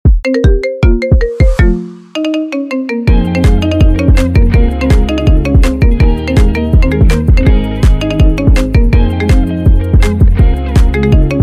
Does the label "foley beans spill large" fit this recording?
No